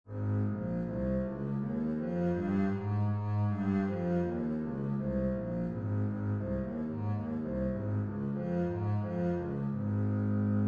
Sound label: bass A flat major